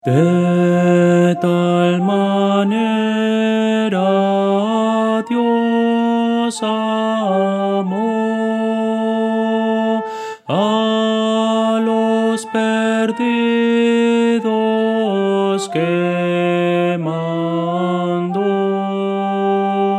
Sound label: soprano